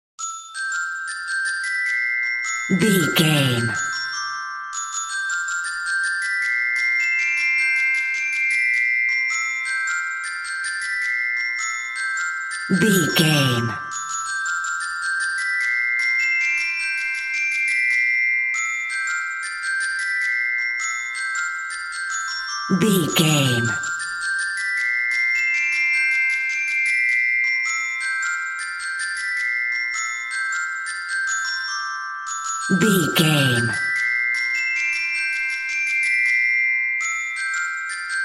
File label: humpty dumpty glockenspiel